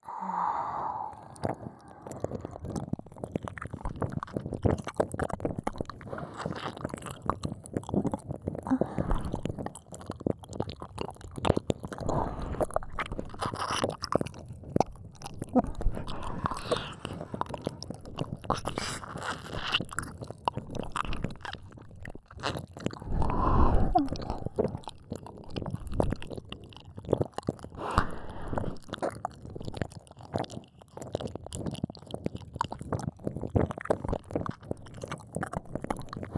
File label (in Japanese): 耳舐め; 女教師達にマゾバレしてはいけない絶対射精禁止のメトロノームリズムオナサポ♪